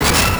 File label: Orchestra Hit; Genesis